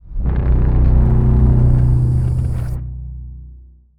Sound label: juggernaut necroid move; Stellaris; necroids